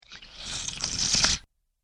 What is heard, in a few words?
Flesh Eating Monster; Big And Loud, Small or Scary